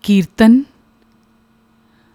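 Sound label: Kirtan, Carnatic Music; The common forms in vogue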